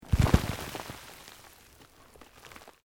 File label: Earth Loose Move Short